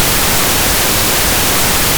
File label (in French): son bruit rose